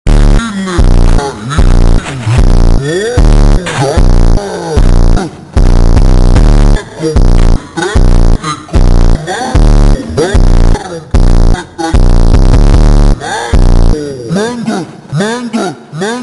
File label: super Slowed Reverb, funk